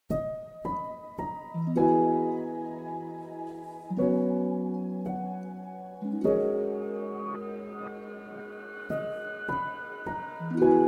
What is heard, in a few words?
music